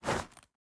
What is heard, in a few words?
duffel lift; Petrichor PSTVL